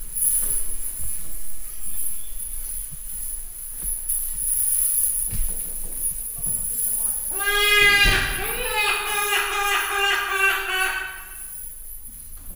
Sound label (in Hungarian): bizony nem tévedés, trombitás szarvcsőrű; Győri Zoo 2014